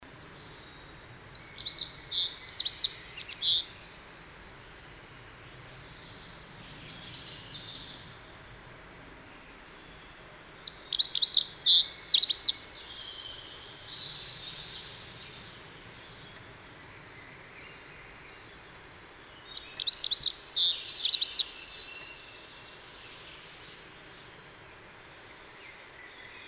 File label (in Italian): Zigolo muciatto; valsolda